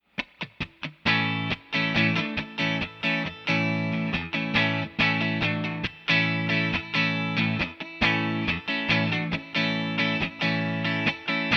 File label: echo Note; STcenter